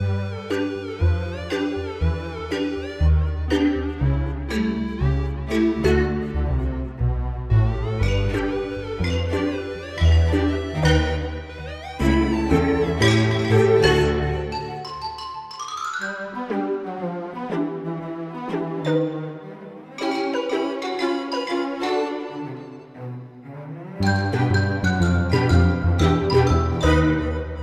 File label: Merry Ambient